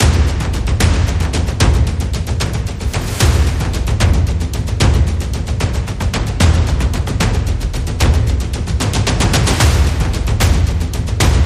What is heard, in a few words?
Horde, Percussion